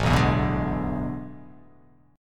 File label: A Major 7th Suspended 2nd Suspended 4th